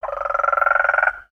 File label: Pets Tortoise Happy; creatures; Lego